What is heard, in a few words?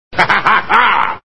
hahaha; cstrike; saysounds